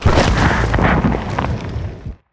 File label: ABYSSM attack